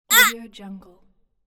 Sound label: girl grunt